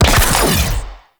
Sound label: Void Angel Portal Beam Fire Alt; Abilities; Warframe; Zariman